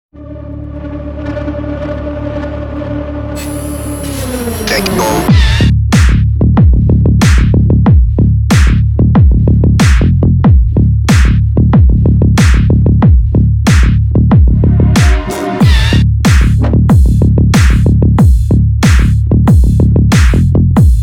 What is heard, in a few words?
Hyper Car Bass